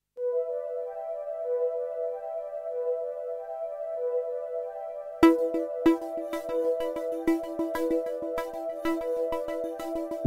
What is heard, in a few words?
jingle Heartbeat